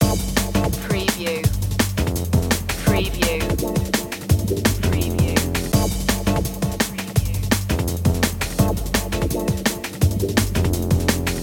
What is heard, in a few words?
loop, chill